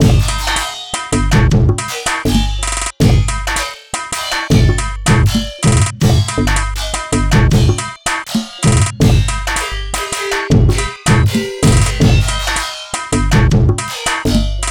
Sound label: phonk